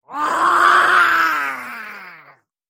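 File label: goblin sound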